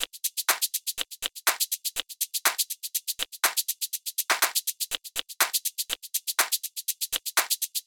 122 BPM Tops, percussion; percussion essentials 1

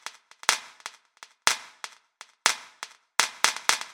122 BPM Sidestick; mmb studio soundtrack essentials

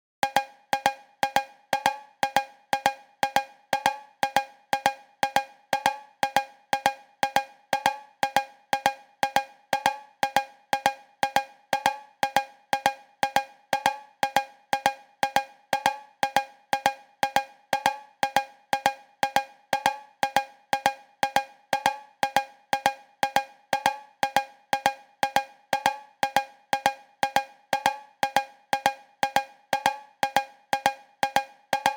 122 BPM Cowbell; mmb studio melodic

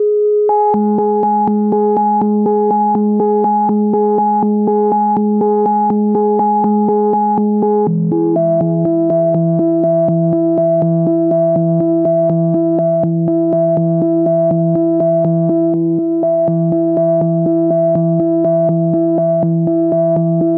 122 BPM Melodic Arps A maj F min Tonic D; MMB

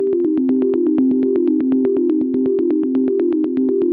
122 BPM Arps A maj G min Tonic C; arp essentials

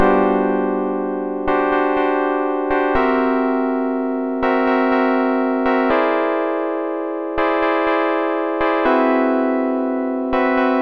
122 BPM Tonic C Gmaj Emin Chord Progress; mmb chord progressions essentials

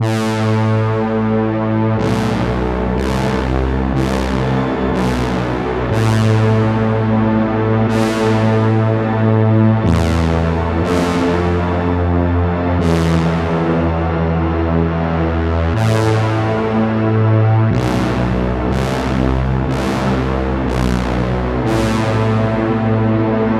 122 BPM Brass C maj A min Tonic A; mmb studio soundtrack essentials

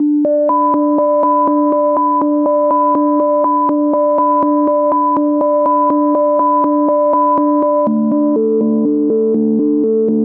122 BPM Melodic Arps C maj A min Tonic G; MMB

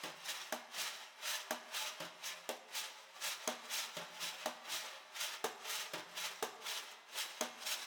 122 BPM Foley Ambiente Background; mmb studio melodic

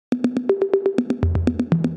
122 BPM Toms, percussion; percussion essentials 1

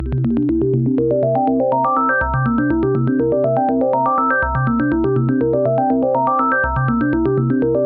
122 BPM Arps F maj D min Tonic C; arp essentials; Aura

